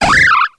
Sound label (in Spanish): Grito de Bunnelby